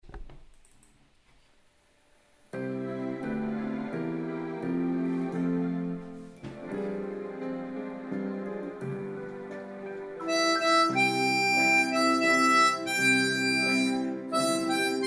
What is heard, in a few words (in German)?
Guten Abend, gut Nacht C, Mundharmonika